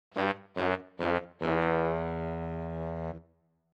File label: trombone looser sound